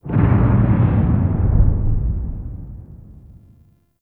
Mission Start Stinger; Giant Killer

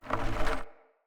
Wood Stool Drag Scrape

